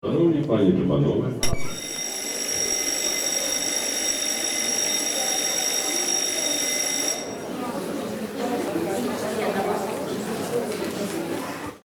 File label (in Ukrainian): dzvonyk u fojye teatru; teatru im. franka